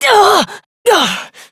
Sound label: Pain, Adult; Warframe; Adult Operator; Dialog Barks